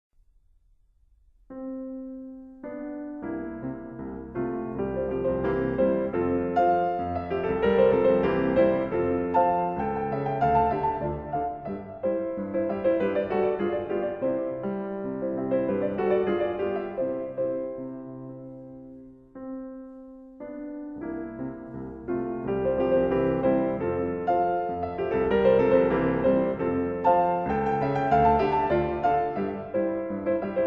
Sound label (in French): pieces pour piano; II Andantino grazioso